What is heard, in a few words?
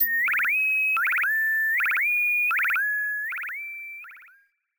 Warble Tone; Gionee ELife-E7